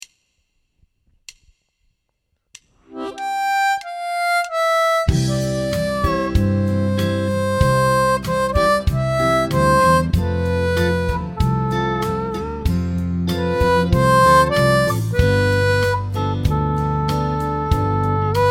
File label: O Sole Mio C 95 harp